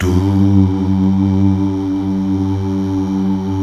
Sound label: Mal Duh; Best Service Gigapack 1